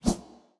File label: Ui Map Menu Open